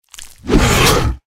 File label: deathclaw attack power; npc